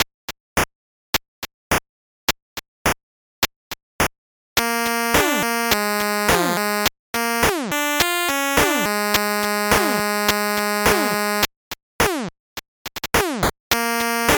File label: 8bit bgm slow